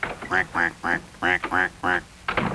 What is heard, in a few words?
quack